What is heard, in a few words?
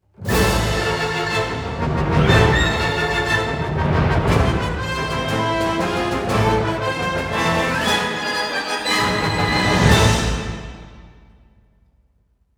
Battles Win; Star Wars Battlefront; Hoth; Imperial